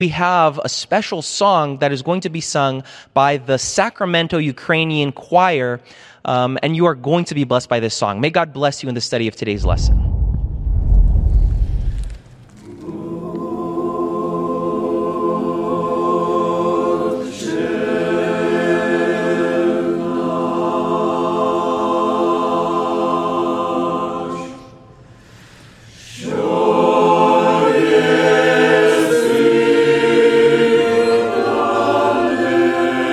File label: Ukrainian Church Choir; Sacramento; Special Musical Item